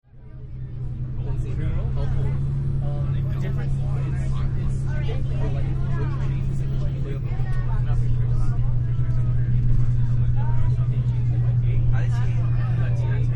Bus Ride Interior Perspective, People Having Conversations In Chinese, Cantonese; Bus Ride Interior Perspective, People Having Conversations In Chinese, Cantonese, English And Spanish, Kids Talking, Bus Engine Purring; Human